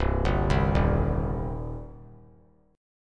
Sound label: C Minor C down; arpeggiated; JGuitar